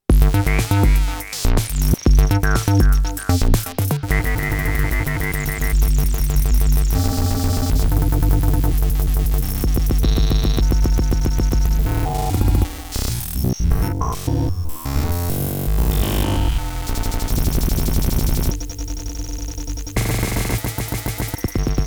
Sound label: Electro Break; Korg Rerun